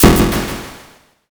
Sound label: thunder magic